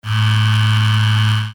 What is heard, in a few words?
alert vibrating